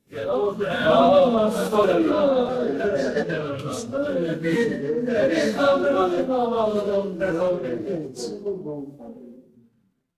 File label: crowd gasp